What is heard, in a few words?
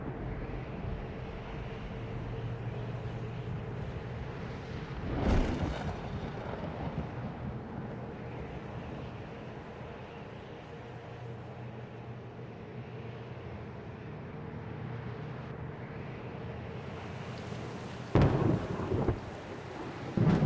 menu ambient; Shtriga Summer Camp